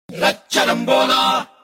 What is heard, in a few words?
Message Tone